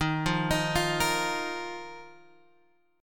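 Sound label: E flat Suspended 2nd, Guitar, Open G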